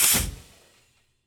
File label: Aircrafts Su-27 Canopy Open End; DCS